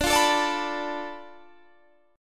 F 6th Flat 5th D, chord

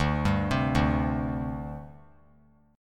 D 7th Suspended 4th, down; arpeggiated; JGuitar

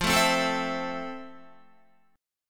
F Major 7th Guitar; strummed; JGuitar

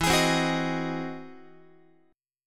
E Augmented Major 9th, Guitar; strummed; E+M9; JGuitar

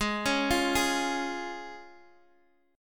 F Minor Sharp 5th, Ab

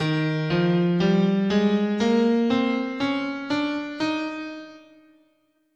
D sharp Bebop Dominant, scale

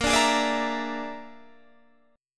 Bb 7th Flat 5th Bb, Guitar Standard; Bb7b5; strummed; JGuitar

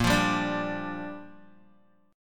Bb 6th Bb, Guitar; strummed

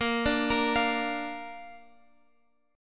Bb Major Bb down Guitar Open G

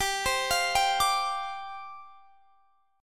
G 7th Suspended 4th G down Guitar Standard; G7sus4 arpeggiated

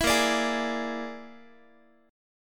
A sharp 7th Flat 5th A sharp down Guitar; A#7b5; strummed; 19,18,17,18,x,x

